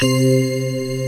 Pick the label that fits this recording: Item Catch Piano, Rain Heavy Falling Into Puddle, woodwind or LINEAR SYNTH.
LINEAR SYNTH